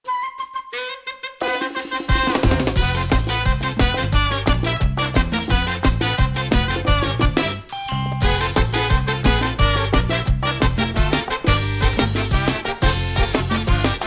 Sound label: Arrangement; SMB3